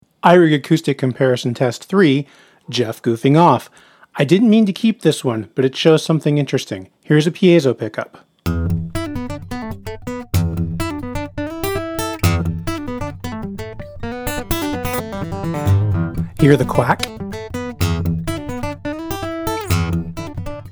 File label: iRig Acoustic Comparison Snap